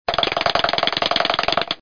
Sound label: WOOD CHOP; DR BRAIN; BUGS